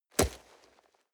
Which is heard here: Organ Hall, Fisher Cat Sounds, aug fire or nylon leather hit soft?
nylon leather hit soft